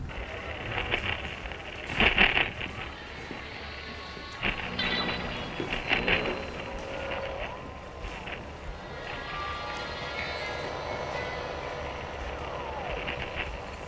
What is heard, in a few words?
radio noise; BSG2000; Crossroads